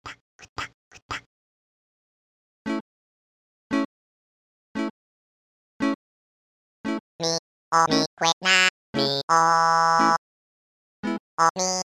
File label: Reggae (LIVE); Animal Crossing